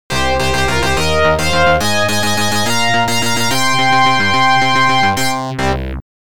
Stage Clear; Gang Busters; arcade; Police Force

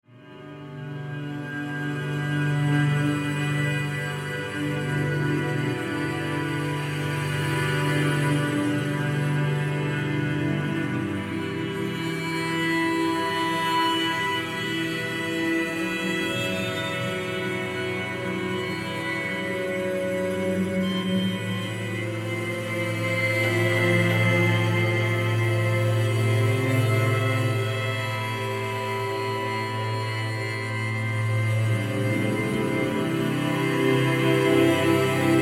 Exploration Solostring; Horizon Zero Dawn; PS4

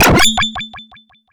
Coin Inserted; Risky Challenge; Irem M97; arcade

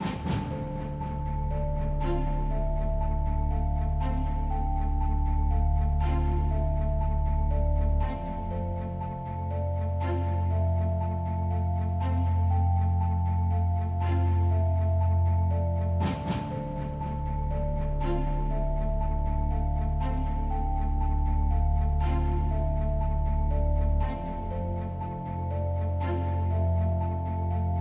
Battle Bg

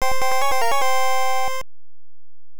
Floor Start; Babel no Tou; nes

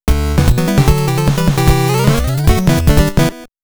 Pattern Clear BGM; konami famicom chronicle vol.3 rom cassette edition

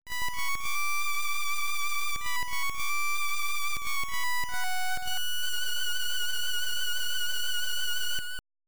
Get Full Moon Cello; Legend of Zelda The Link's Awakening 1993 gb

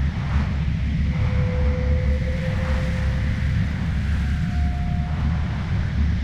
SFX loop; Disney's Dinosaur

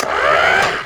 Rocket Start

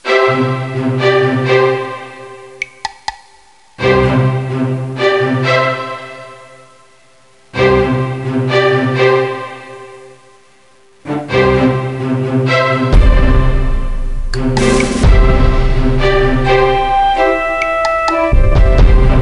Bg Menu; Super Fun Chess; NDS

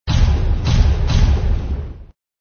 SFX Heavy steps

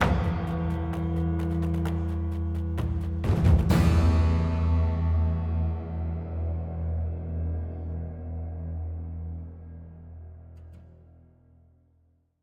String; Assassin's Creed Unity Dead Kings

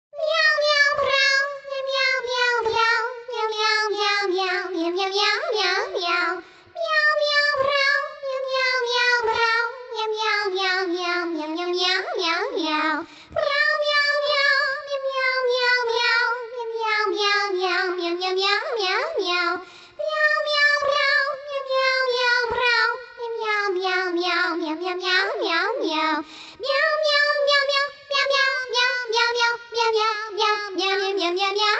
La Habanera Voc; purr pals 2007 nds

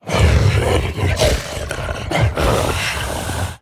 Zombie Monster Idle; Pain Train

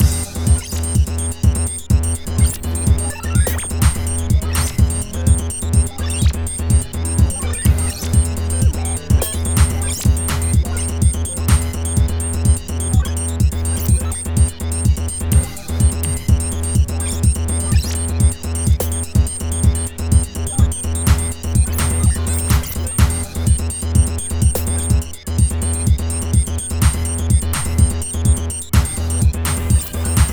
Dungeon ~ Abandoned Factory Underground Passage; Persona; psx

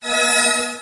City Complete

